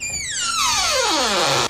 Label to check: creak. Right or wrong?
Right